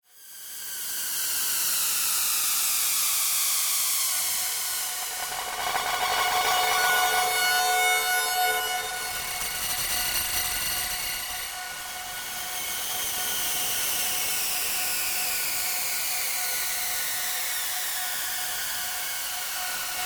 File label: Martian Signals, Eerie otherworldly radio frequency signals.; Sound Design